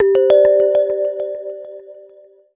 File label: Carillon; NiceVibrations; Feel; AHAPPresetsDemo